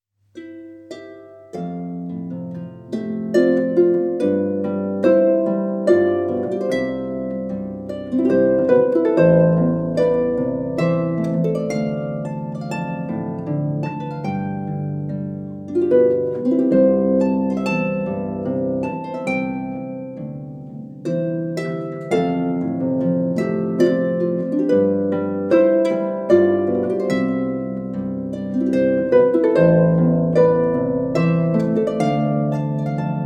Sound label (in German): Harfenweis